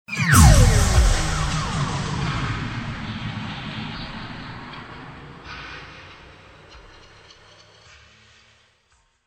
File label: scientific laser impact; overte; partyBall